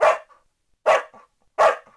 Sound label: bark; DAoC